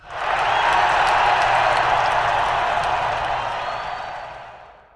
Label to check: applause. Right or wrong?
Right